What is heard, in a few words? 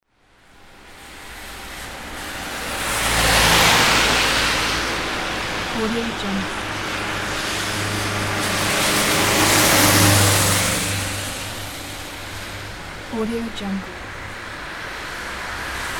cars driving by on wet road